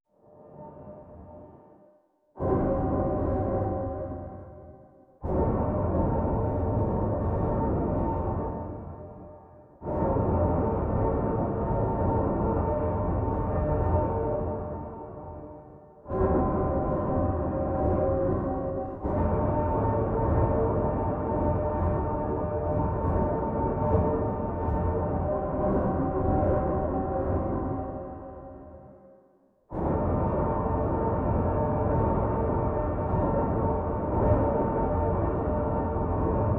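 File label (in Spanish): música suave misteriosa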